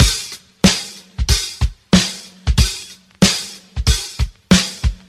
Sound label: Drum loops, Funky